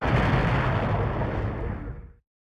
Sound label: Drone Explode